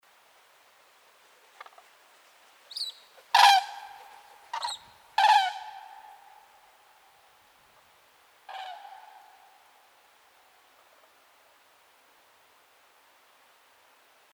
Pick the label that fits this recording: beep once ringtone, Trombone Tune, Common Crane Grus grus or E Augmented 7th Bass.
Common Crane Grus grus